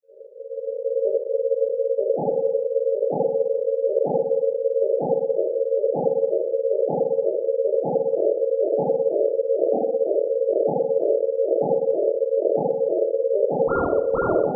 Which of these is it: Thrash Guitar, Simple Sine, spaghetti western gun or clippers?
Simple Sine